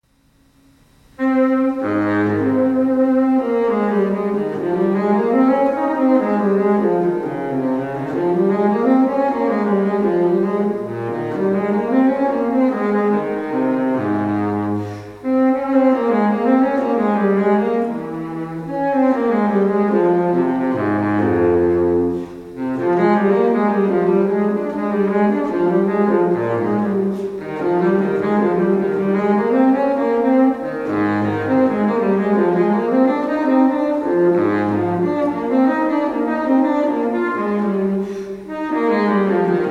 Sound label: Violoncello Suite I Allemande; J.S. Bach